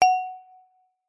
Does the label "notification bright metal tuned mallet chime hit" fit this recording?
Yes